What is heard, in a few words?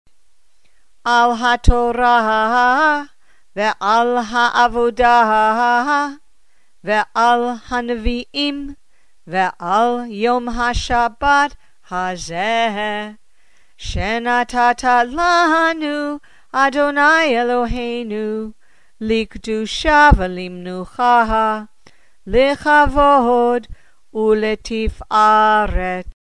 before Haftarah